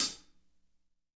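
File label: hat closed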